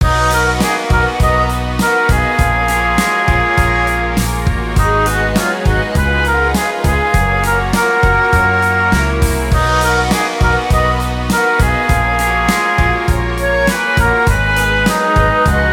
Music event; Power Pro Kun Pocket R

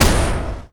Fistball Fall

